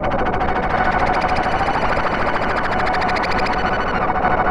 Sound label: BATS; AKAI Anime Sound FX